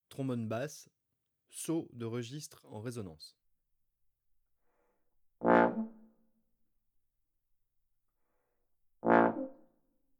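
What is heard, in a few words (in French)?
basse saut de registre en résonance, trombone; Effets; Effets harmoniques; traite orchestration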